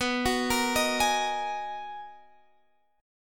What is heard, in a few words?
B Major 13th B, guitar